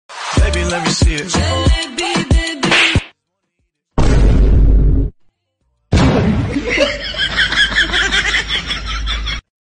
Lebi Lebi with slap; challenges

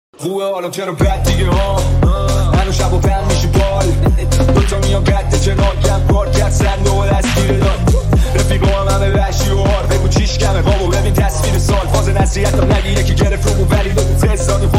Remix

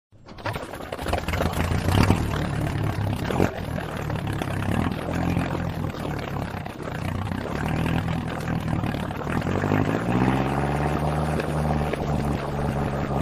Boat Engine, motor boat

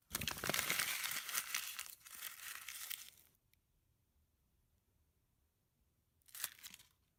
Paper Crumpling Sound

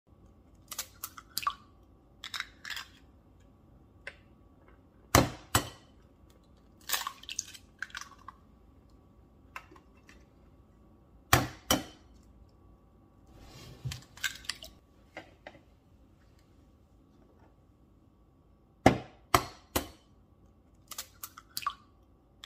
Egg Cracking; kitchen

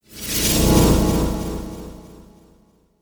Mystery Transformation Swooshes

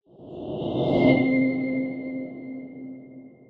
Whoosh Crystal

